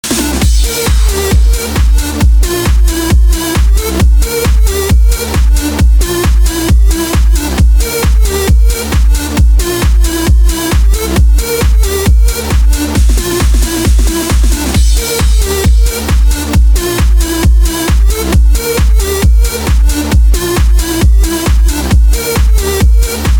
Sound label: electro mix